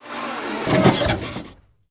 dog throw van; Half Life 2; Episode 1; intro

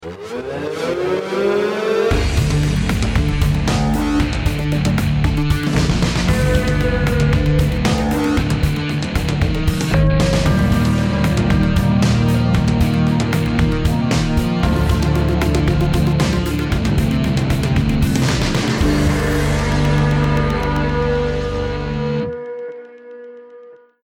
start action, music

